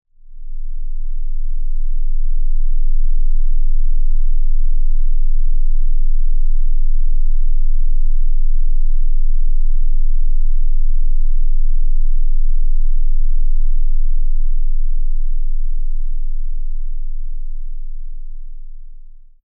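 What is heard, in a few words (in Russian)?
инфразвук 18 Гц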